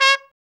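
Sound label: BRA TRUMP, trumpet, brass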